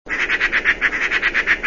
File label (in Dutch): ekster